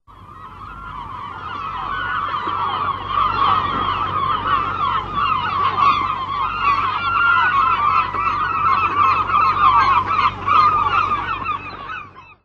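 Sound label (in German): Möwen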